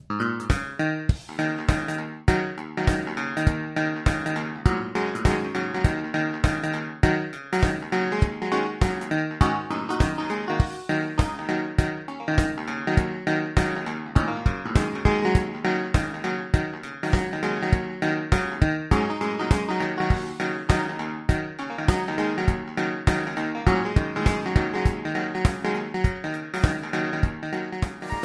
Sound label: hohner clavinet d6